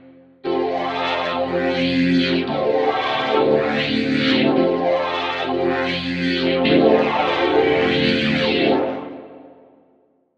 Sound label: Ohh Wa Choir